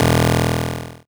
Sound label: GB Bass E, chip; CHIPSHOP HITS